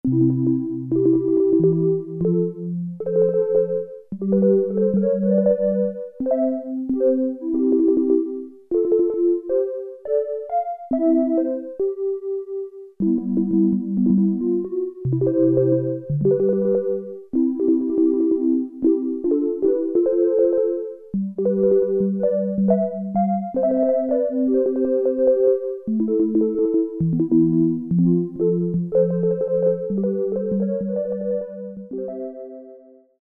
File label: MARIMBAS, Oberheim OB-8; Factory Patches